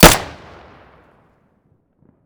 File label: pm muzzle stereo, weapons